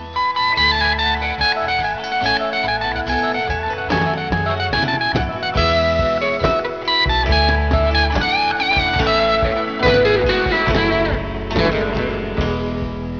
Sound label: riff